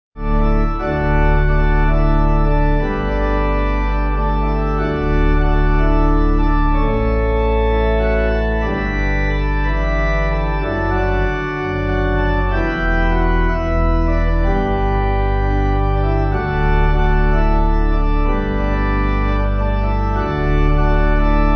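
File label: pipe organ hymn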